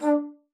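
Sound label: D3, bass flute; Xsample VOL-2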